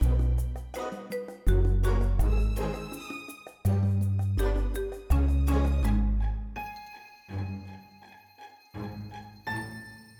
Fetchquest Loop

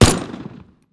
tools gun

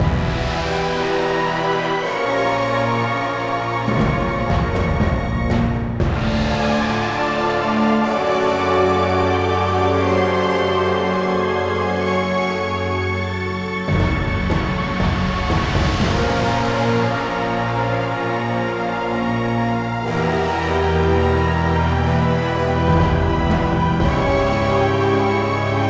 Boss Intro Intense

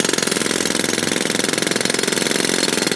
Industrial Drill Loop

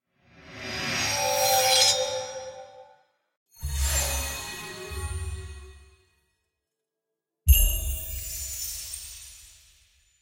Ui Menu; Archeland

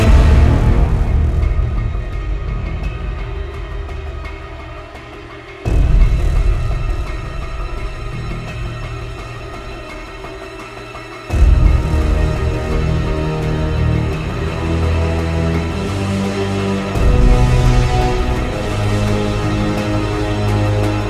Battle Low; bulletstorm ost; ps3 ps4 switch windows xbox 360 xbox one

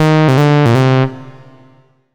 Solo Clean Sawtooth Negative; startide

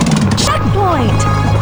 Checkpoint; cruis n exotica; arcade

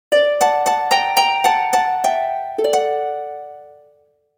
Quest Jingle; DovaSyndrome

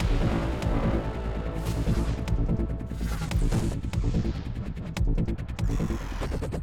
Mood Tension Heavy; solo leveling arise android ios windows 2024

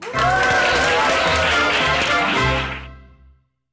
Game Win Sound; Jolly Battle

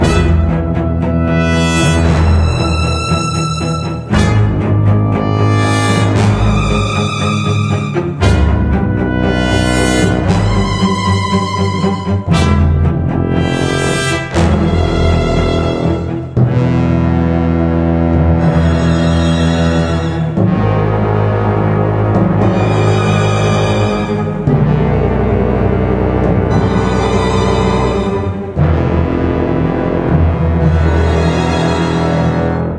Tension Loop; Regalia Of Men And Monarchs

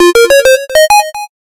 logo jingle; domant; Gravity Circuit

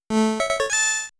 Blaster Head -Armed

